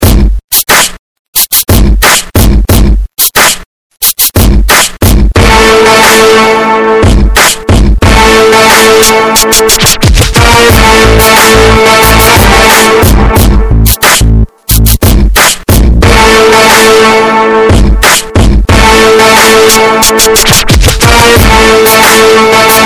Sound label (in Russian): Klubnyi bas